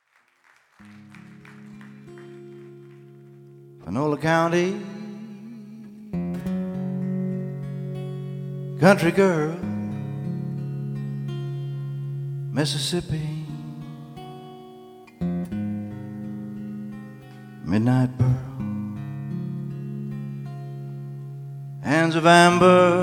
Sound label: Deep Country Blues